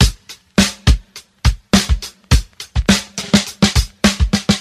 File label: sudden pause, drum loops, funky